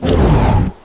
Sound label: Critical Stop; Windows 97; Plus!